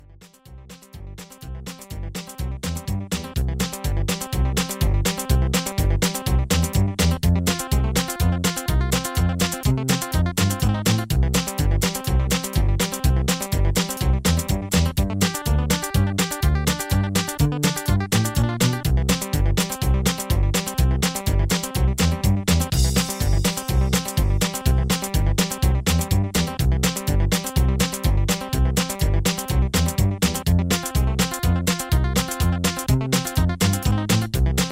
Patter Rhythm Patter

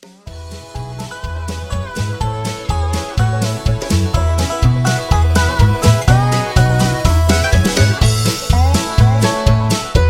Instrumental

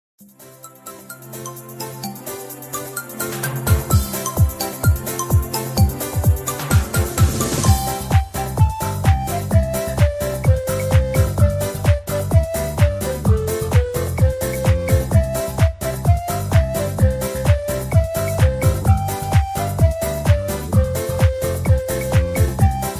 Patter

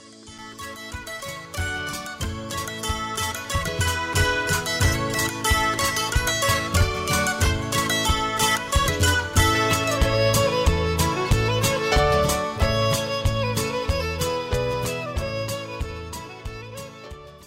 Instrumental Two Step

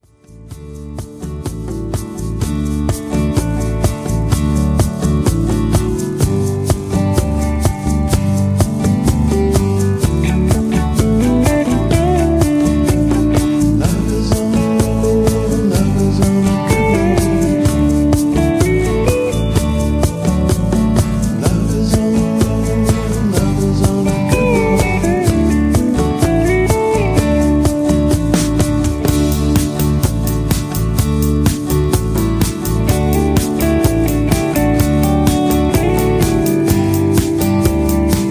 Instrumental with BGVs

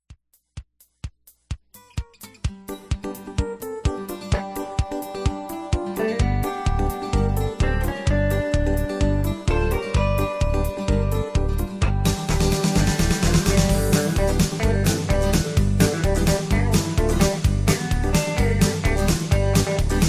Patter

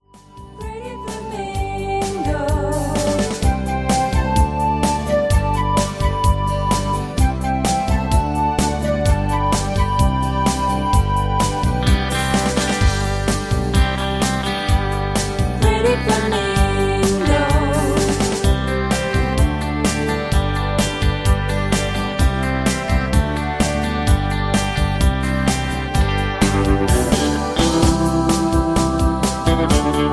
Instrumental with BGVs; Singing Calls

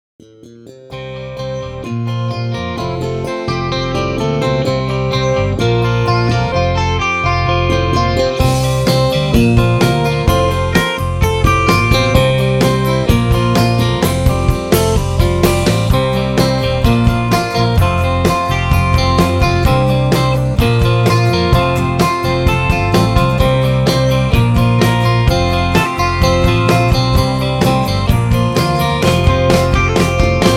Instrumental with No Melody

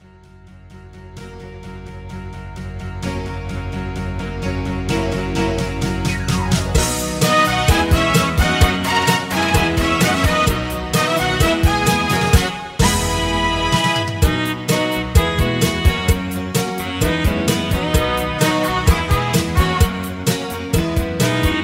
Hard Rock Low Key

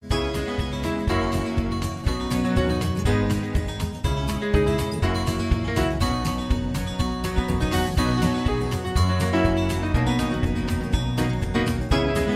Low Key

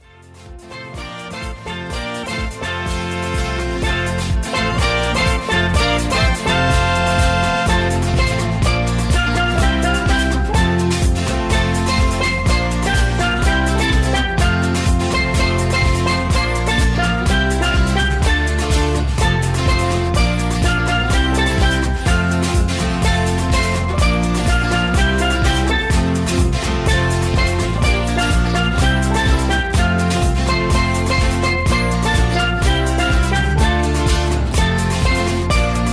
Patter

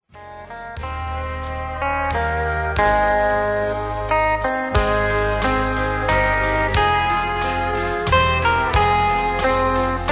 music with LEAD in LOWER KEY

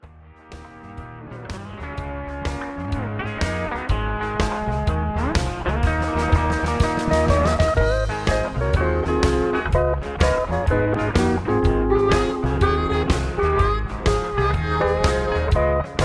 Blues Low Key

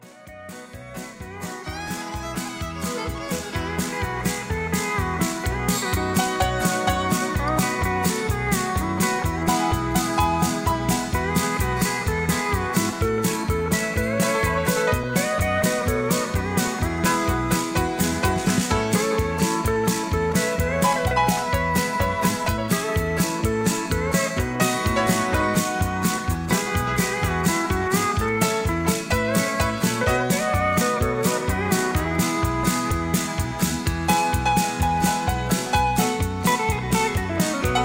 Instrumental

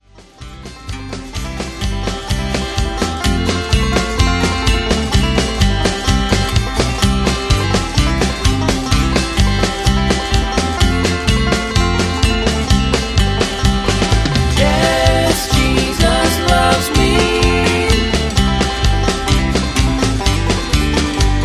Harmony Only On Choruses

